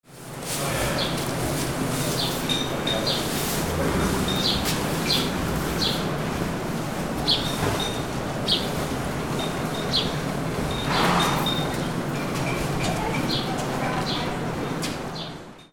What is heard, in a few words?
ANIMALS